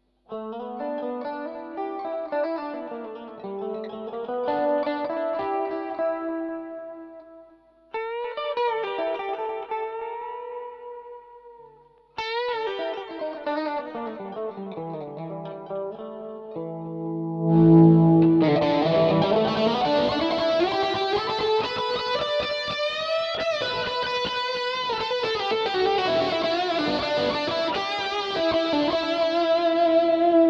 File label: Autoclean